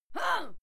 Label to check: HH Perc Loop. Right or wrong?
Wrong